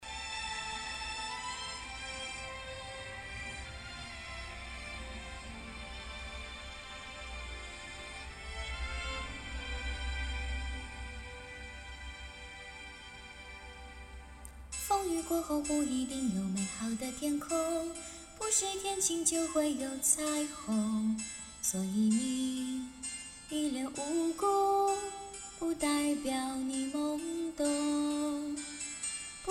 翻唱

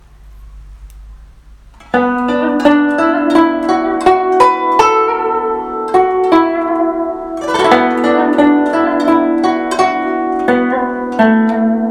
古筝 版

